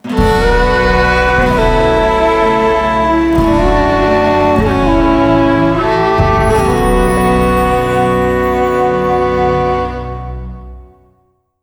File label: Jingle Failure